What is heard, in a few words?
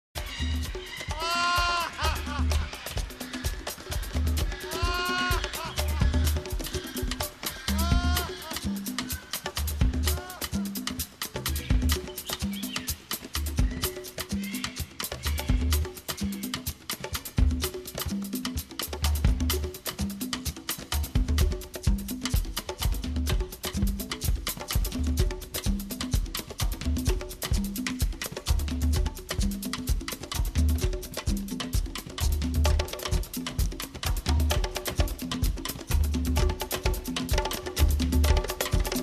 Laughing Drums Dancing Drums